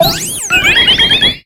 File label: pokemon cry; eternal flower